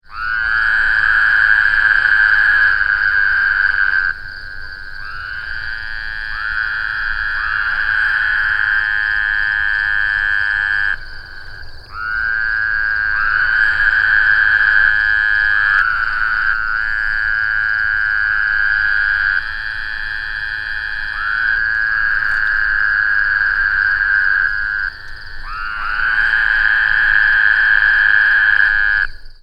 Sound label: b woodhousii